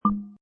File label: Nice, Button sound or menu sound; Buttons (Sound Effects:Multimedia)